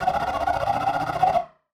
Casio, Glitched, malfunctioning synth

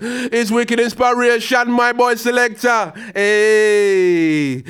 G-PHRASE, RAGGA IN G

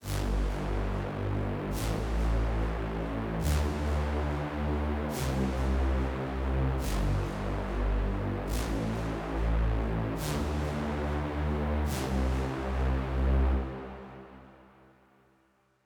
PWM Kit 142BPM Verse - Low Synth WET, Gmin; Vengeance - Essential Clubsounds Vol.5; Melody & Synth

